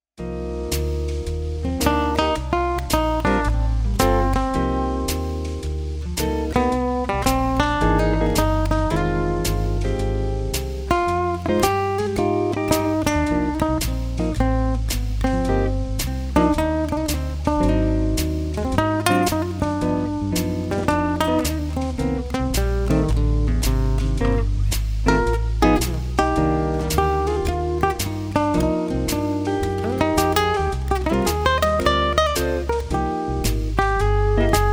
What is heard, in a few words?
Pink Blues